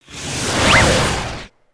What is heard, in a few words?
Rocket Blast Off; cinematics